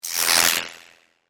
Chopper Electronic, Production, Element, Chopper, Electronic, Hum; SoundEffects-BlastWave